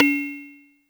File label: Rim Shot FX